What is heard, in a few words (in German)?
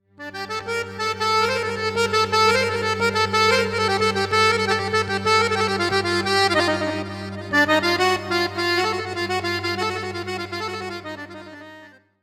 Akordion; Arabische Instrumente